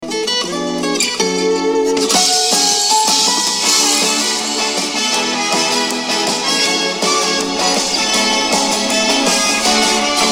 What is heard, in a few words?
Persian Cover; انیمه